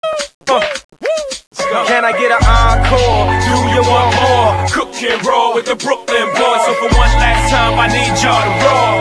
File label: win zombies; ambience; cstrike